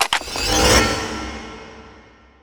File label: spell pickup